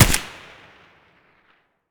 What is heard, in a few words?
an94 muzzle distant; STALKER; IWP; abakan